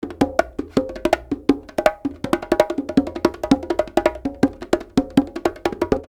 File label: Live Perc Riffs; Jungle Frenzy 1